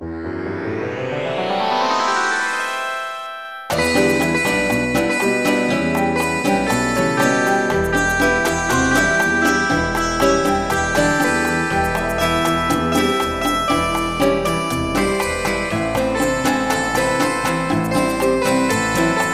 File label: Variations on Spanish Romance de Amor